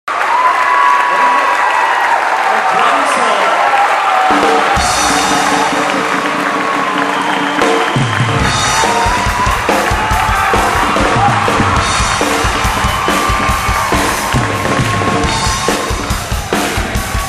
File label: Drum Solo; hymns